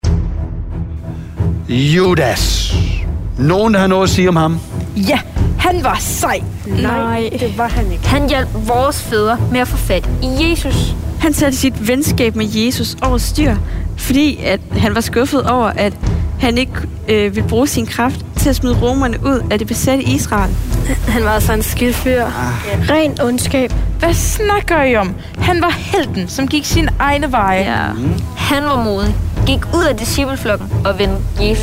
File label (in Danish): Drama musik og drama